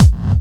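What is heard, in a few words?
hard bass drums; breakcore; Bazzdrumz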